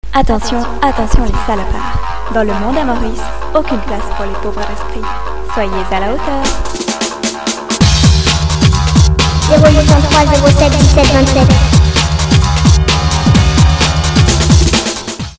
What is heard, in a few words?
jingle